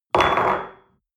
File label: espresso place on table hard wobble, restaurant cafe